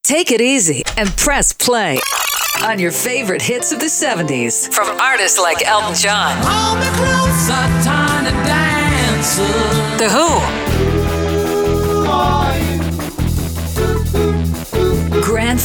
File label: 70s Classic Hits